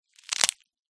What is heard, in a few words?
horror gore body part break snap